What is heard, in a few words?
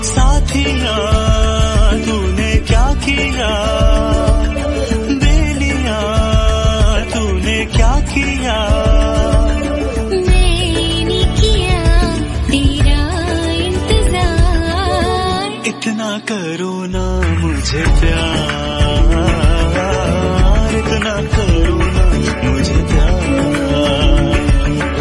hindi ringtone